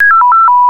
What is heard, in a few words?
Hangup, Signalling Tones Elevator Phone